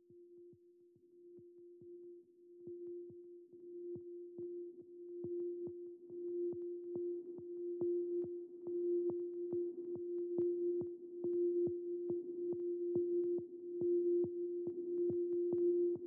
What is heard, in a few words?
Dark Chime Meditation, No Melody